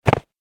Snow Foot Step; human; Footstep Sound Effects

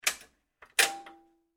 Household Dryer Dial; Cleaning Sound Effects; Cleaning (Sound Effects:Household)

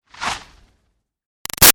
Arrow By Ruffles Slow; Bows and Arrows; Sound Effects:Combat; Big Room Sound

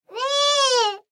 Human Moan; Moan Angry Male Toddler; Pain; Blastwave

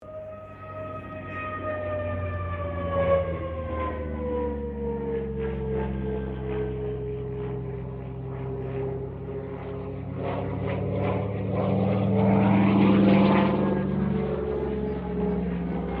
AIRCRAFT CA-13 BOOMERANG PASS STEREO; Airplanes (Sound Effects:Vehicles)

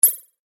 Digital Click High Pitched Confirm Scifi; Digital Clicks, Blips & Alerts

